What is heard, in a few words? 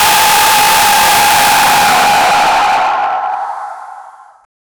horror loud jumpscare